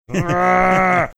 growling

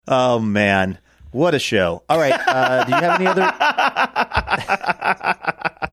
saying oh man what a show having good laugh

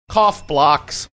cough

